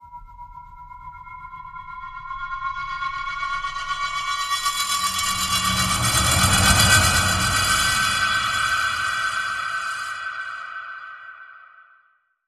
Glass Rattle Rise

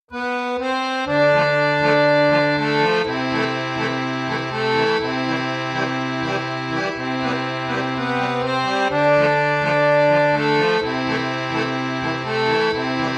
Harmonium

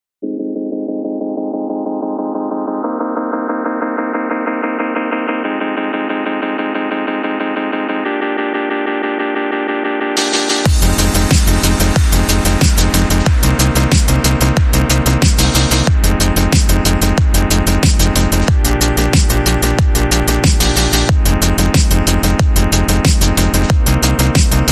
Drones Rule Sub

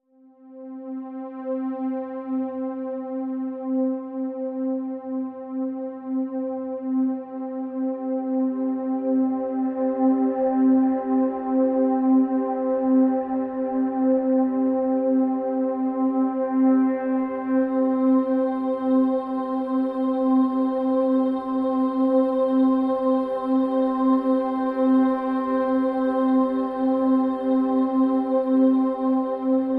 Pads Vapors C

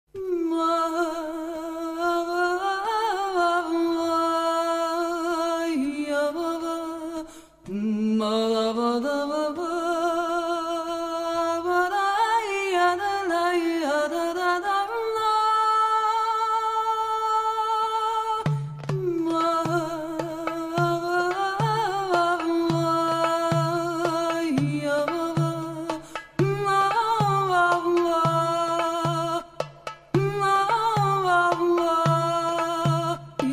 Balkanish Song

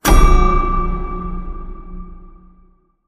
Broken Piano Pluck

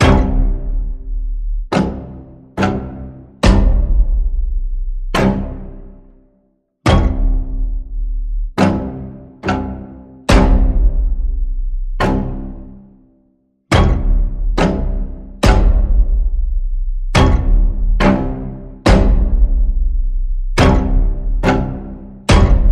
String Pulse C, Key of C

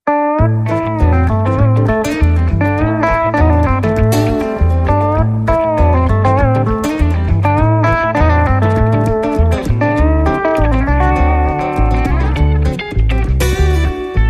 Saloon, No Drums

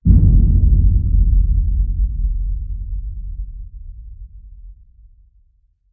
Hit Low Remote Boom

